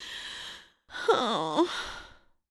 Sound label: Female Tired Breath